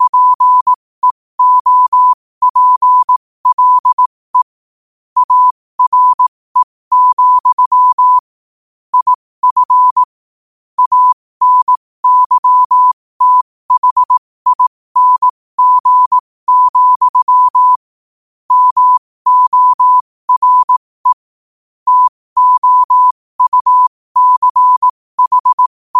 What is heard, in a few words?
5 wpm, morse; Daily Quote